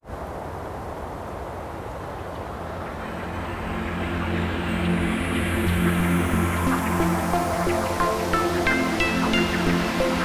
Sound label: Inst.